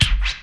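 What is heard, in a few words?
scratch L, vinyl FX; E-Lab; X Static Goldmine 2; akai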